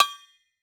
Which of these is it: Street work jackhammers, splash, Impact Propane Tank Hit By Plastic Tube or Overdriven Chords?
Impact Propane Tank Hit By Plastic Tube